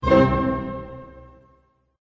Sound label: gentle orchestra hit; Melodic Stabs and Hits